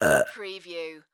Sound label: Burp Female; sound-effects-bible